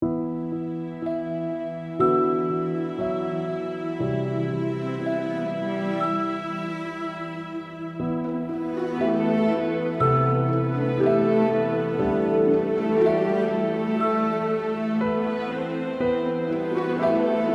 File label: Emotional Ambient